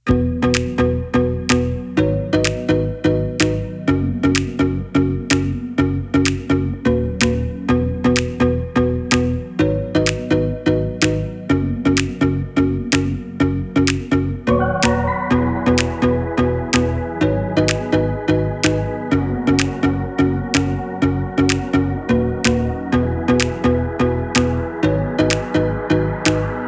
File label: Instrumental Version; filmbcool